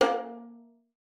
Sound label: FING A#3, VIOLIN; Xsample VOL-5